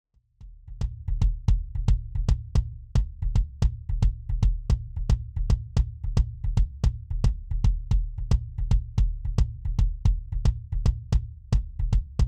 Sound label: Kick; Sonnox